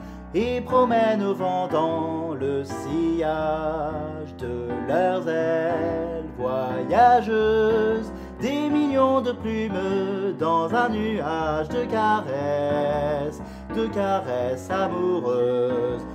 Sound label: Soprano